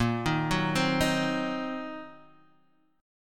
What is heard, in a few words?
C Minor 7th Flat 5th Bb, chord